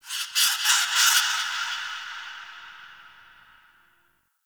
Ext Creature Distant High; Ambience; Misc Outdoor; Warframe; Infested Eris

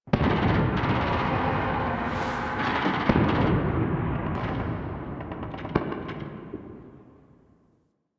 Ambient Distant Explosions; Infested; Warframe; NineteenNinetyNine

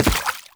Step Water, Foley, Footsteps; Enemies; Warframe; Lasrian Soldiers; Lasrian Butcher

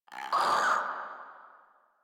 Infested Crawler Hear Sound; Warframe

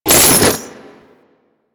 Condemn Chains Beam; Warframe; Warframes; Priest

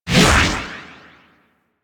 Tengu cast; Warframe

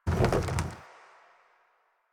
Pylon Plastic Roll

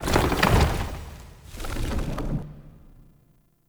Root Shield Hit; Puzzles; Bean Stalk

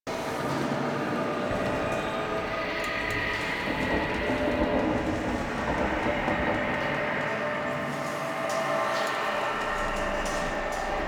Interior Library Bed Loop; Warframe; Ambience; Entrati

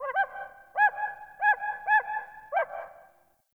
Forest Open Glade Bird Far, Day

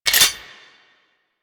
Cannon Mech; Grineer

